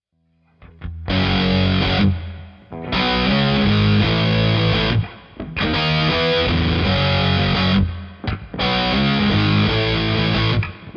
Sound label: Heavy